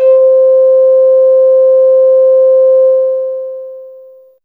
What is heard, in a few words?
Glass C5, Bells; Fairlight CMI IIe